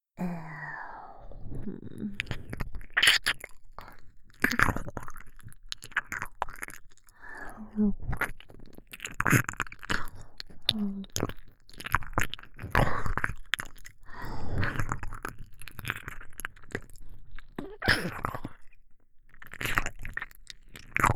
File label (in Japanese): 耳舐めループ(姉), ASMR; 難易度HELL